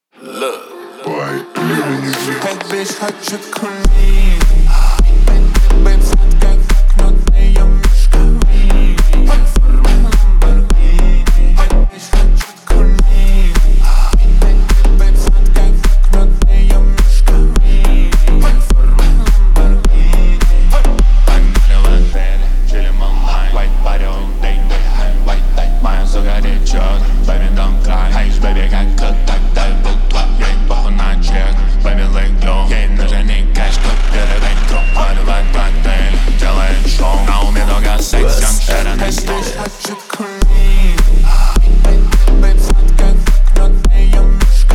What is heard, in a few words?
31 35Hz Low Bass